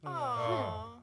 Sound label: Small Jeers; the princess and the frog; Orleans; Music Voodoo